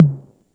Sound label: low mid tr 606 analog tom c sharp key